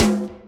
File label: tom, drums; virtual drum sounds; custom drums